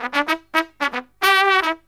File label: TRUMP, Killer Brass Riffs